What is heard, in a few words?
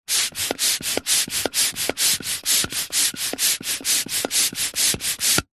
leisure balloon hand pump plastic pumping air inlet fast